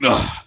Attack Light; Putress

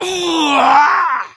troll crit; Halazzi

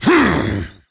Attack Medium; Creature; Varimathras

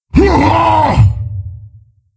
Wound Crit, Creature; Steelbreaker

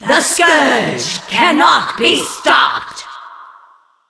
Sisters Death

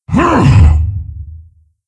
Attack, Creature; Steelbreaker

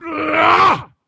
Wound; Captain Rupert

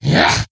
attack, creature; Shadron

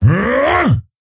Attack; King Ymiron

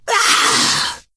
Wound; Ionar

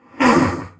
Wound; Deathbringer Saurfang

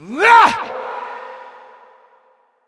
Attack; Creature; Bolvar Fordragon

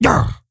Stonebark Attack; Elder Stonebark

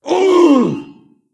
Wound Crit; Stormcaller Brundir

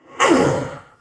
Wound Crit; Creature; Deathbringer Saurfang

